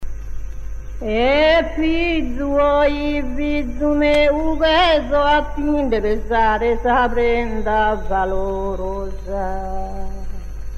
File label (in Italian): attitu, canti monodici non accompagnati; ossi